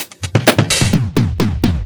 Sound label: Fills 128BPM, Clubsounds